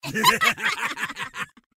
attack; Mad Mage; Mob Voice

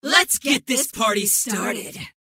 Mob Voice Regen; Bad Brawler

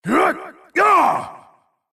MobVoice Black Knight Attack

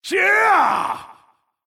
MobVoice Black Knight Attack

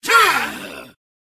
Attack, hunter; Vicious Hunter; Mob Voice

